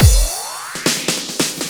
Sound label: Loops Fills; Vengeance Samples